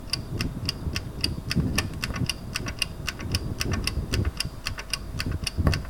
tick tock oven clock, A kitchen oven clock recorded in a large room with stone floor